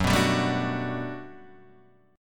G Major 9th, F sharp

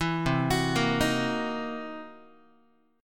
C 7th Sharp 9th Flat 5th, C, down, Guitar; arpeggiated; 7,8,9,8,7,x; 4A,4E,5A,5D,5G#,6C#; chord; JGuitar

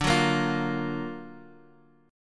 Gb Augmented Major 7th D

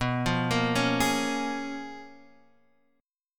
Bb Minor 7th B; Bbm7/B arpeggiated

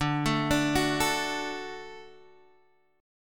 D Major D down Guitar Half Step Down

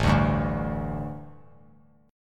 A 7th Suspended 4th, A, down; A7sus4 strummed

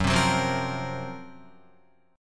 Gb Major 7th Flat 5th Guitar; GbM7b5 strummed; JGuitar

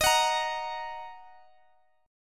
D sharp Minor, Guitar, Open G

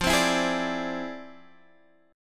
F Minor Major 13th, Guitar, Open G

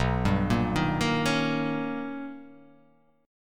B Augmented Major 9th B, chord; B+M9; arpeggiated; JGuitar

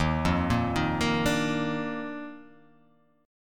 D sharp Major 7th Suspended 2nd D sharp, chord; arpeggiated; x,6,3,3,3,5,4,x; JGuitar

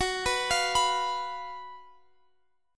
Gb Major 7th Suspended 4th

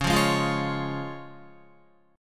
Db Major, Guitar; strummed; JGuitar

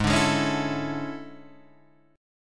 E 13th G sharp

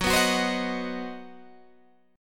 C Minor Major 7th Flat 5th, F sharp, chord

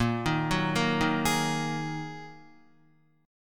Bb 7th Suspended 4th Sharp 5th Bb Guitar, chord; 6,6,4,3,3,3; 4E,4A,5D,5G,5C,6F; arpeggiated

